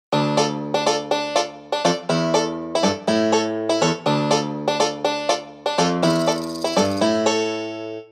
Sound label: Banjo, ringtone